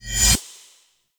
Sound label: Choir Eyes End